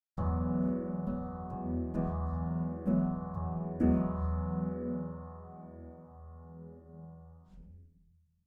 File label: Piano phaser; 移相效果器则是通过相位偏移制造出一种涡漩迷幻感十足的声音变化